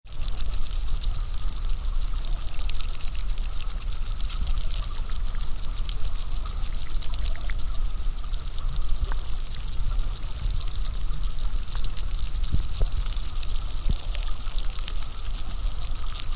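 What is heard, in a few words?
Passage Island Splash Pools, Isle Royale National Park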